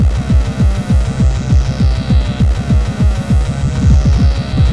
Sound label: drumloop; awe32